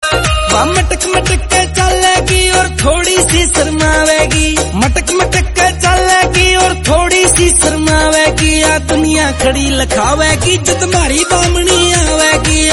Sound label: haryanvi song